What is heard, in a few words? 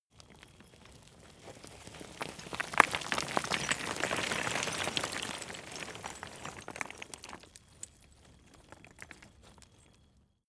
stone large landslide; natur-tiere; steine